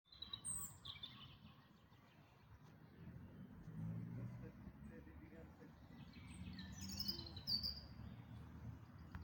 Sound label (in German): Rotkehlchen; Insel Poel; Mecklenburg-Vorpommern